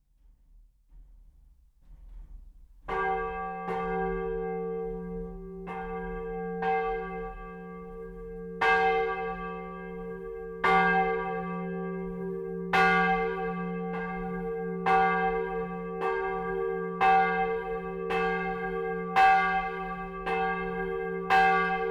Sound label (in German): Jesusglocke; Weslarn, St. Urbanus; 1557